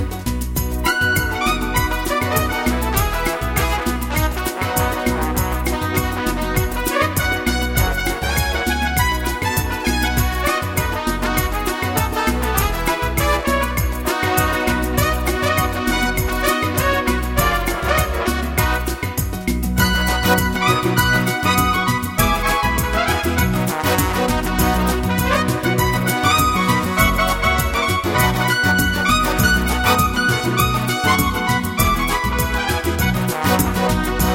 Salsa

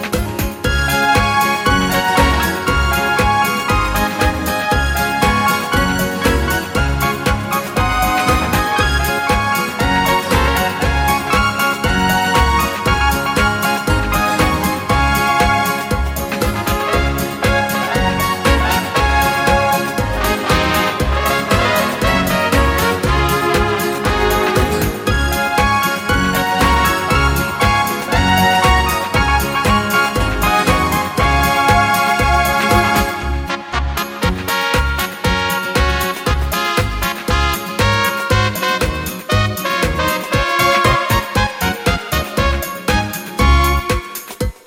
Mariachi Polka

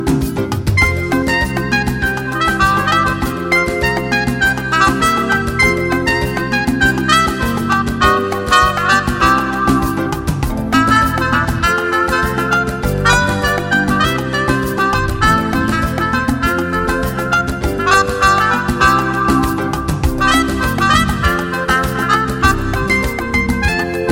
Latin Jazz

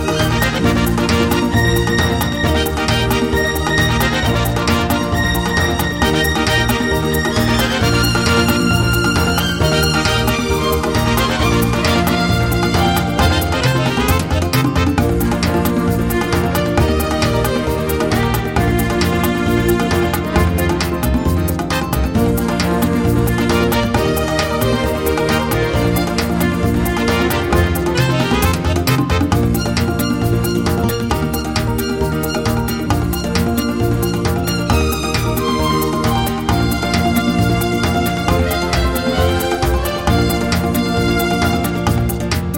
Merengue

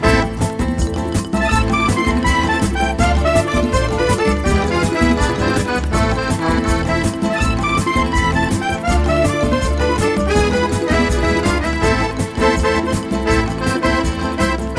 Smooth and Delicate

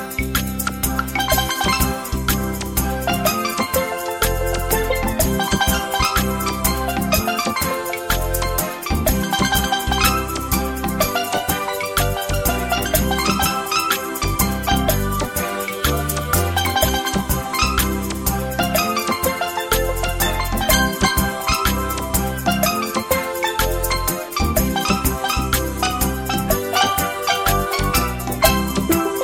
Unplugged Reggae

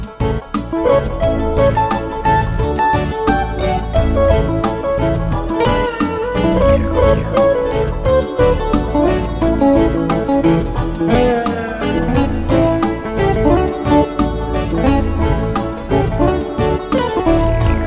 Lazy Reggae

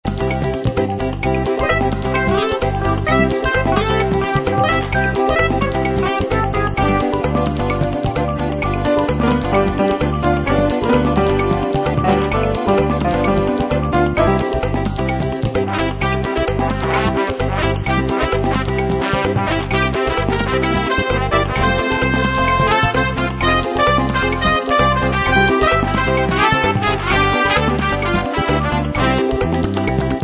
Disco

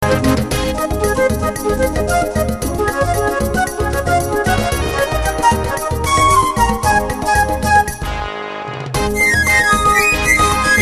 Wild Latin Jazz; bluesjazz

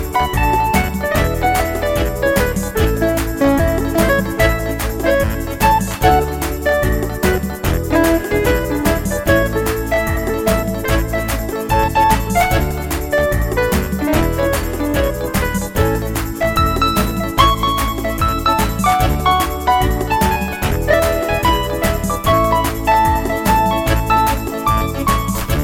Mad Ska

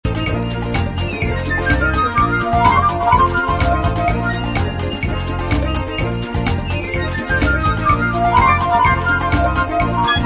Samba Explosion

